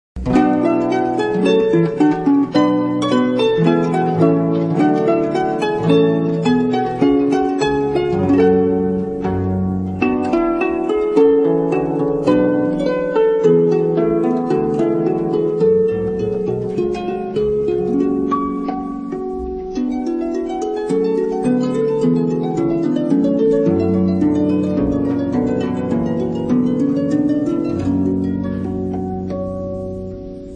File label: harp; francisque